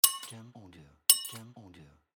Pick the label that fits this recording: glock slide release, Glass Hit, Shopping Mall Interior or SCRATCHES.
Glass Hit